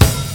00s sharp bass drum sound a key; kick drum samples

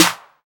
crunchy snare sample e key; snare drum samples

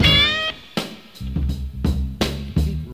82 bpm hq drum loop sample g sharp key

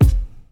steel kick drum sound f key

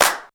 clap one shot D key; Duration 0:01; percussion; single hits one shots

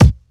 nineties clean kick drum single shot c sharp key

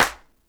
clap b key; percussion single hits one shots

